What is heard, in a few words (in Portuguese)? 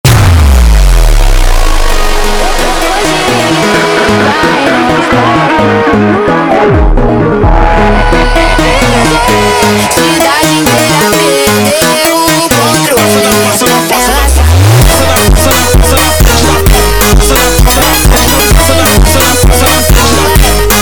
Montagem